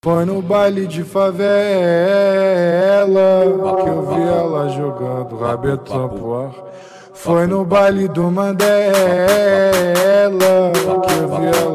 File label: especial funk